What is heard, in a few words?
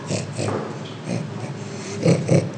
Orangutan Laugh; Credit Dr Marina Davila Ross